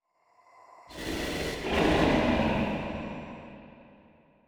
boss ghost breath

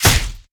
Weapon Stick Dull Critical; Inotia 3

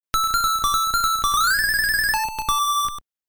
Laughter Flute; Ganbare Goemon Sound Treasure Box

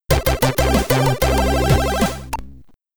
Stage Clear (SID Stereo); Turn It II; Commodore 64

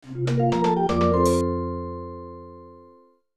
Game Starts; 4 Nin Shougi; SNES

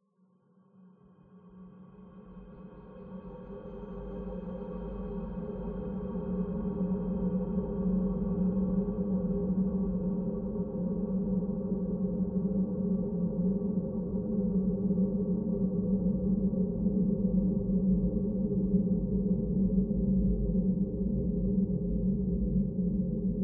Ambiance Dark Pad

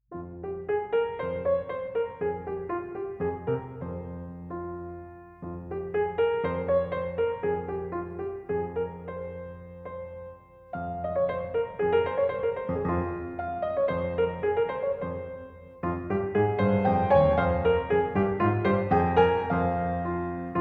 Village (Piano Version); little big adventure symphonic suite original soundtracks 2020

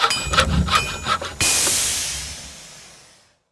sound Shop Build

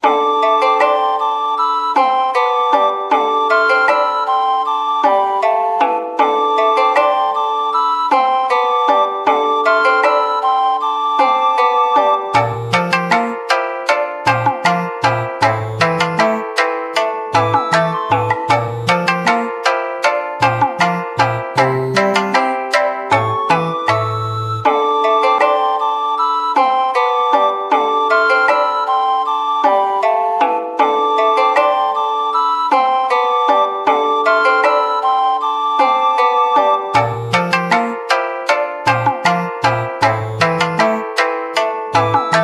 Jingle Rpg Map

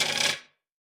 monster Noise; Lethal Company; Windows

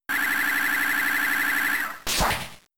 Aeroblast; Pokemon; Gen 3; attack moves; RSE

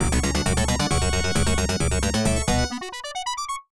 Unused Jingle; super x; arcade

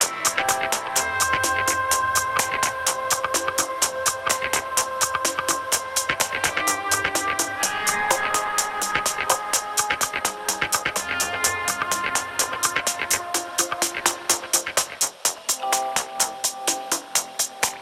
Satellite Muzak Loop; Headlander

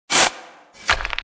SFX Worker Success; life is a game android

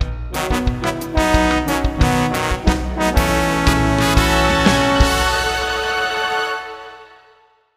Jingle Op Fanfale; A-Train City Simulator; A-Ressha de Ikou 3D; 3DS

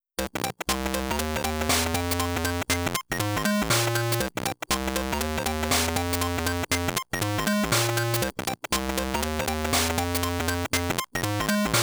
Ending Staff Roll

